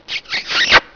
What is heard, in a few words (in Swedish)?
Puss; Dubbelmoral!